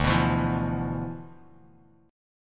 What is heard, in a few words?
D Minor 6th Add 9th D, Bass Standard; Dm6add9 strummed; JGuitar